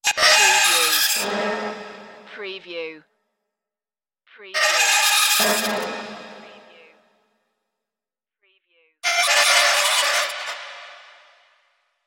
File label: sizzling beam glitches